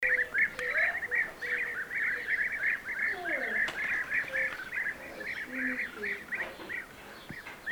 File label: Arı kuşu European Bee-eater Merops apiaster